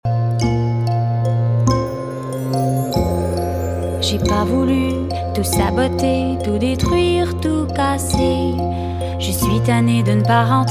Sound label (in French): valse